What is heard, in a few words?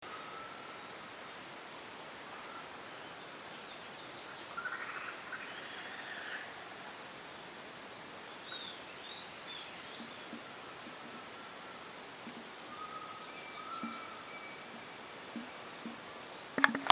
Ringed woodpecker